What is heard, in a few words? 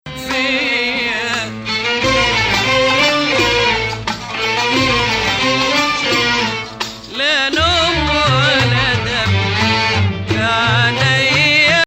Bayati, maqam